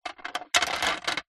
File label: ice cubes movement slide in plastic tray; food drink